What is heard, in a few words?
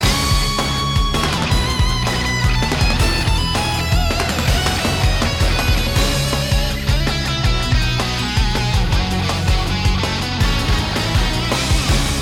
bgm battle; Sengoku Otome Legend Battle; PS Vita